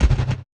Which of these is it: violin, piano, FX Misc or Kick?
Kick